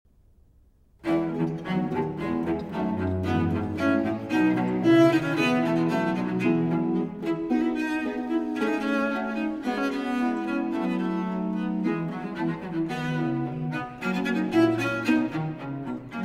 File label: Sonata For Cello and Continuo in F Major; Vivaldi; R.41